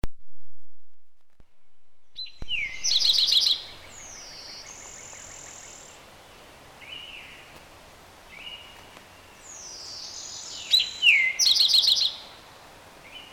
Eastern Towhee Pipilo erythrophthalmus erythrophthalmus